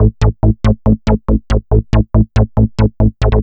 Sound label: Synth